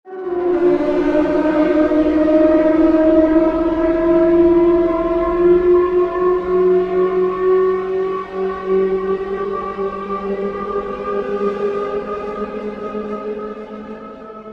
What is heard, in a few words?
feedback rising tension